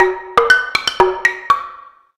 Wood, notifications; FCNT; Arrows-Alpha

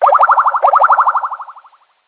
incoming call; Netgear SPH101-Skype-WiFi-Phone

